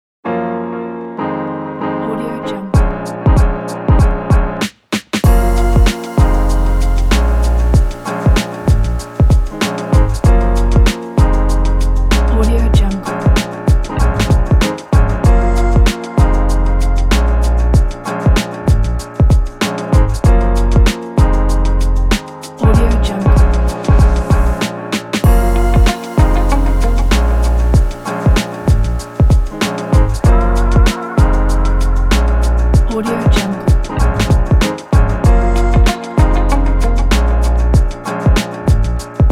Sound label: hip hop